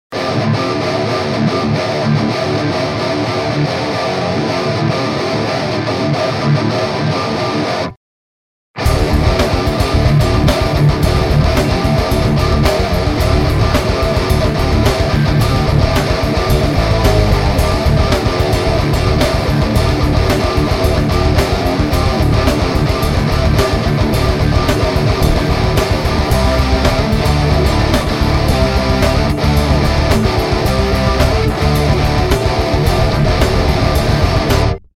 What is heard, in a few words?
Mercury EL34 CH2 Les Paul